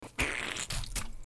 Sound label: poop plop